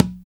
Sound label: FNKCGA HEEL, congas; Drums In Your FACE!